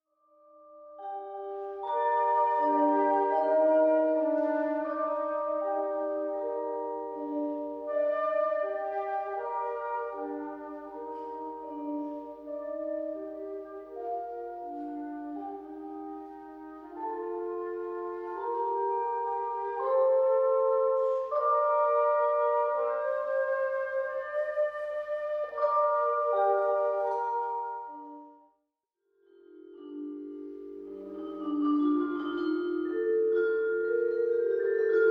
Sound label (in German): Altflote und Vibraphon